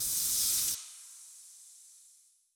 mega shipyard electricity amb

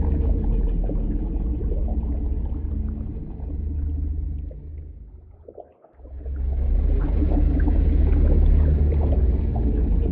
void worms juvenile idle; Stellaris; grand archive; ships

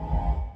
ftl warp charge up ship; ambient; Stellaris

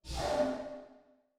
space dragon pulse; Stellaris; guardians

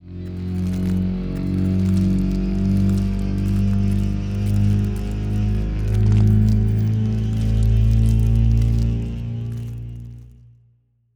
tilt up long; biogenesis

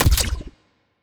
evasion debuff projectile, weapons; Stellaris; biogenesis

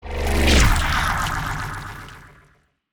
ant ship harbinger move; biogenesis

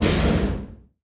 distance swarm scroge missile fire, weapons; Stellaris

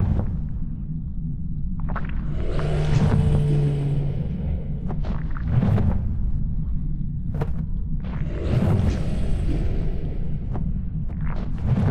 voidspawn reanimated moving; Stellaris

